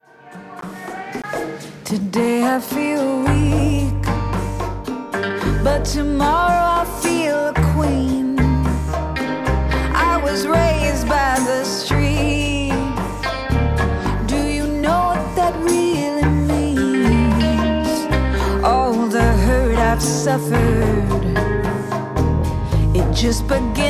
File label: riff